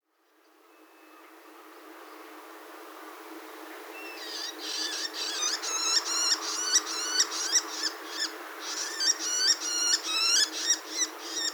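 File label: bull-headed shrike, gifu; mozu; koe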